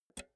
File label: pull out jack multi socket fast; objekte; elektronik